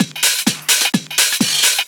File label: Discohouse Loop 128 BPM; Best Service; House Definition VOL-1